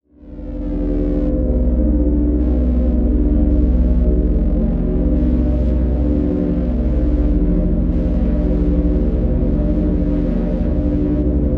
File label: Drone Bed Evil Tension; Production Element Imaging; AdobeCC